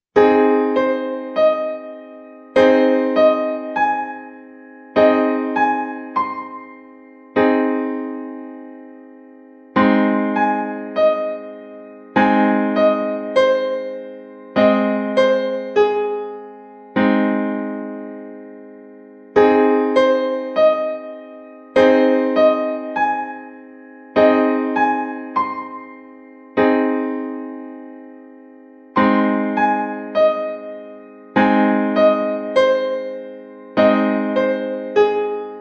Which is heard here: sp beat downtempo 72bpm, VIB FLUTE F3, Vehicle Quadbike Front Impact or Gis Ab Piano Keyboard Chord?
Gis Ab Piano Keyboard Chord